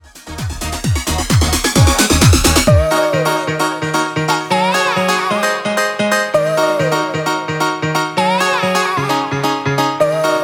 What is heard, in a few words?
Scottish Piano